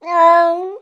Cat Domestic Meow Sad

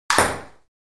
Ping Pong Ball Serve

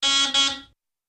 Old Car Horns Single, car horn; Various Old Car Horns - Singles & Doubles - 8 Types ( Beeps, Honks, 'aaooga', Etc... )

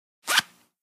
Zipper Short Light

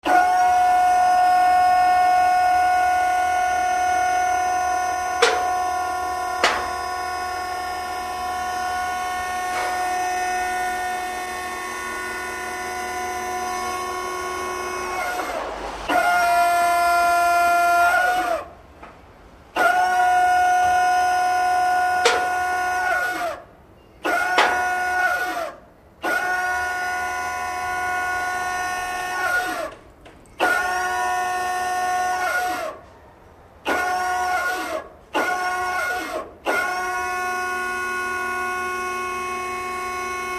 Hydraulic Lift Susta, servos

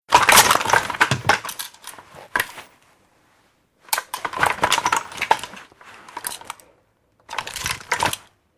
Gun Handling Heavy, handgun mvmt

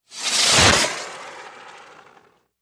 Fireworks Spinners

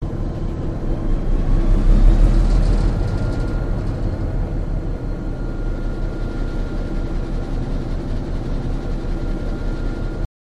Diesel Loco Rev Loop, train int

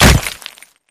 Concrete Crash, rocks & dirt

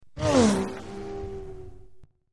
Synth Laser Zap By Pan, zaps

